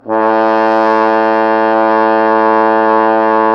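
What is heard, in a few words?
French horn; Sound & Vision - Gigapack I CD 2 (Roland); Brass&Woods1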